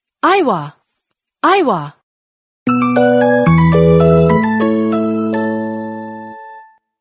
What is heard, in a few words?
Aiwa tune